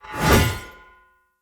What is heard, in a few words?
Great Sword Whoosh Heavy; Weapons; Player; Mendicant Great Sword